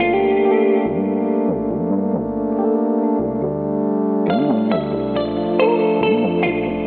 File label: guitar, Phonky Loops